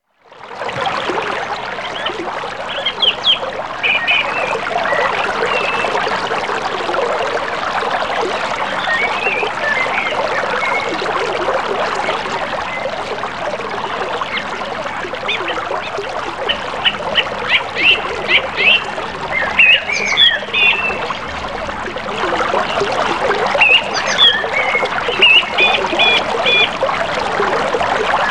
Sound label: Birds Along a Mountain Stream